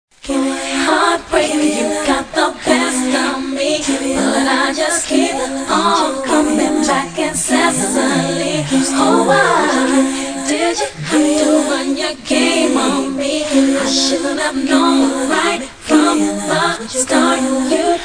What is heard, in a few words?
acapella vocals; Accapella